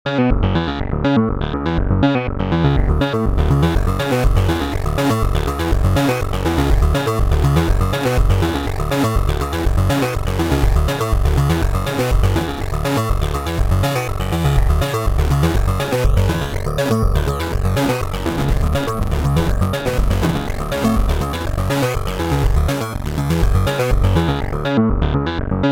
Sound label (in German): Effekt Bitcrusher